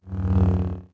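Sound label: lightsaber jedi swoosh slow; weapons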